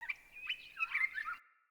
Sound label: Prairie Day Distant Bird